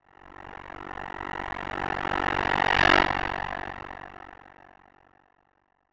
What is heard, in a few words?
Title Transition Reverse Fly By Reverse; Production Element